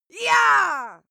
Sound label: female cheer